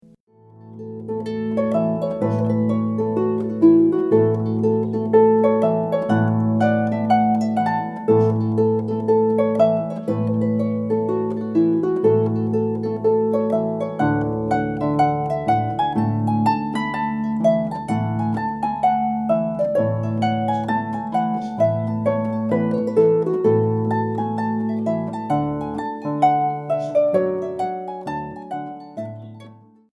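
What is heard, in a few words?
Wedding March Traditional Scottish, harp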